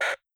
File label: Mic Key Soft, radio; 4GLTE radio audio files